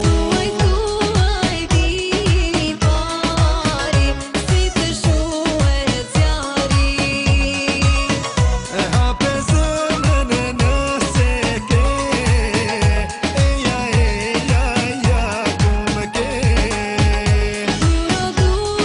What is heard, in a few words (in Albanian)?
Muzike Shqip